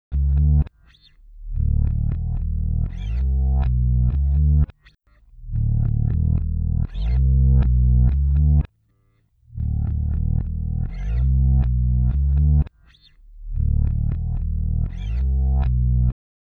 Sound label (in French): basse